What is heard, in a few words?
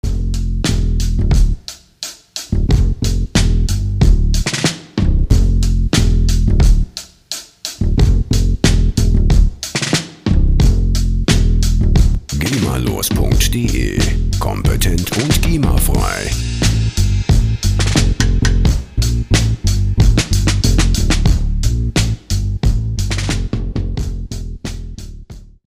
old school club